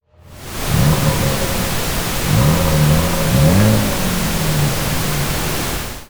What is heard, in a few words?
Rev pink